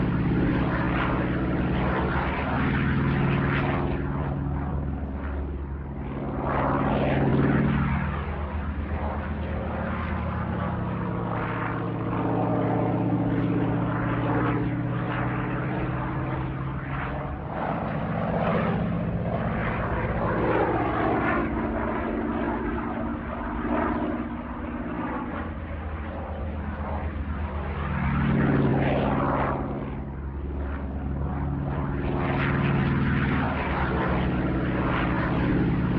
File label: Stuka engine loop